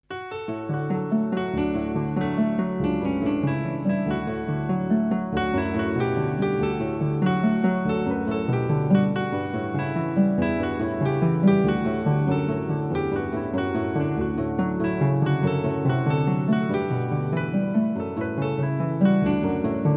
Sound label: Instrumental; Il pleut il pleut bergere